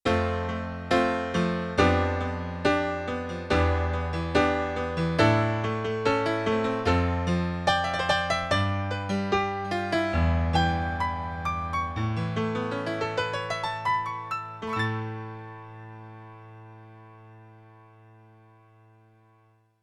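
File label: Yamaha acoustic Piano; Europop Performance 1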